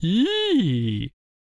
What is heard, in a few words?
Chariot Attack Vocals